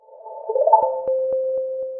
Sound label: notification Sound